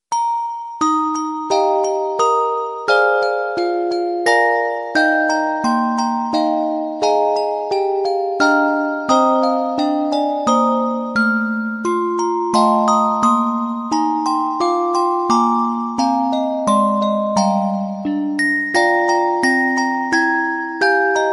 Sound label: beautiful musicbox; alternative